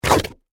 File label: Suction Mud, Texture, Suction, Mud; Water Sound Effects; Water (Sound Effects:Nature); BlastWave